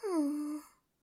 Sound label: hmph